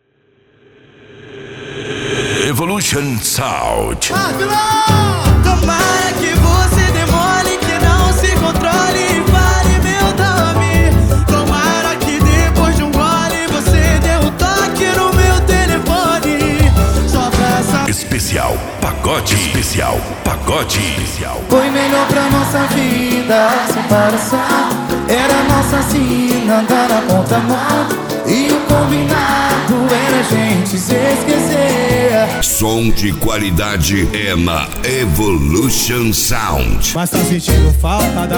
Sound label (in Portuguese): pagode